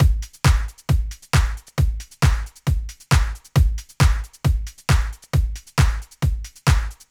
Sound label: Trance Drums Mix 135bpm; Construction Kits; Zero-G Trance Inducer; 90 s Sample CDs